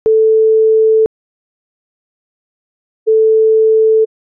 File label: toneburst 440hz 1000ms on off noise